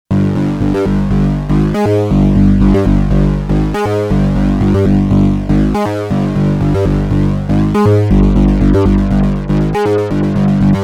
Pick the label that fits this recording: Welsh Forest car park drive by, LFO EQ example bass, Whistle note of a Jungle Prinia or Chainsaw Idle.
LFO EQ example bass